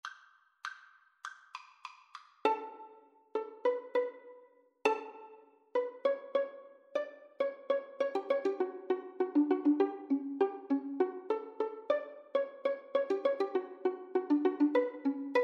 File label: pizzicato polka, violin cello duet